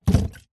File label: bottle plastic full soda water set down hard surface; zapsplat